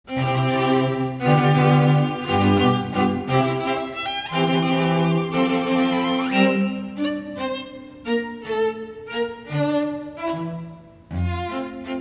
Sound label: waltz